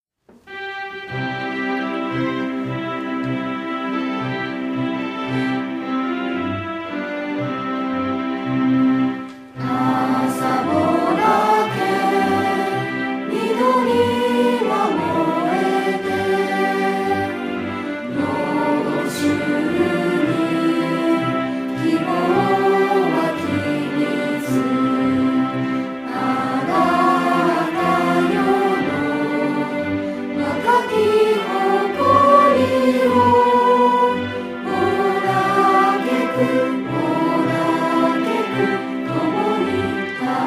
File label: vocal